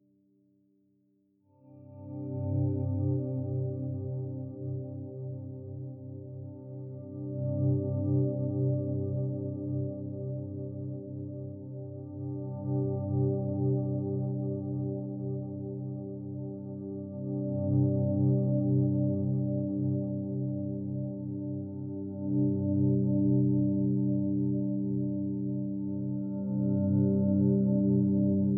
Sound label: Wash GTR Fast Loop; Martian Chess